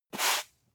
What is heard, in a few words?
broom sweep single